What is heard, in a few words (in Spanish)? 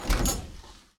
ABRIR PUERTA INSIDE; Yesterday Origins